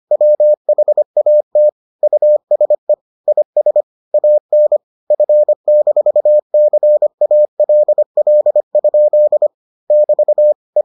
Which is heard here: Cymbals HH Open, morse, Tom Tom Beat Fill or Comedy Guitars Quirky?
morse